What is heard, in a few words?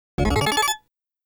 Coin; arcade disc in jaleco shooting